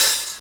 Hi-Hat, 075 Dmin

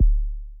Kick

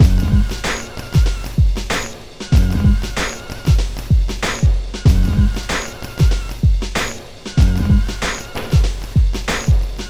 Beat 095, Hip Hop

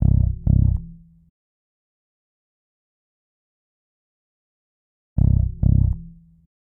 Bass, Hip Hop Exotica, 093 Amin

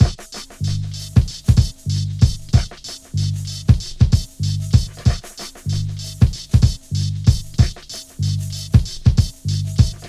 Beat 095, Hip Hop

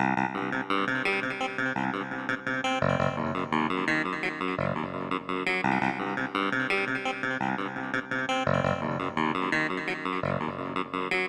Synth, 085 Cmin

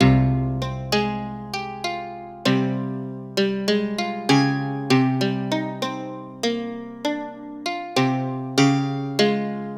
Mandolin, 098 Cmin; Apple Loops; Big Fish Audio

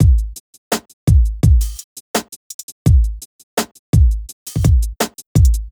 Drums; Hip Hop; Apple Loops; Big Fish Audio

